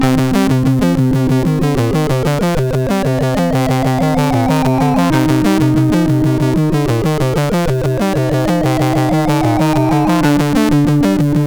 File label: Lload, MOS6581R4